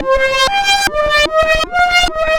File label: Roland C Line, Roland S100